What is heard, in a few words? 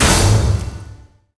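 Flying Staff Damage; Seer; GamigoNA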